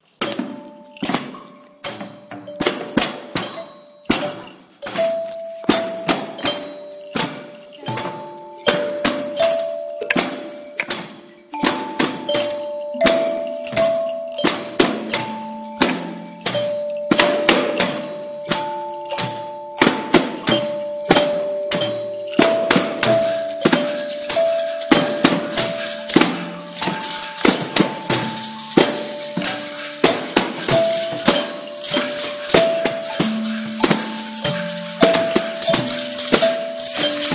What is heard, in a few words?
Metalophone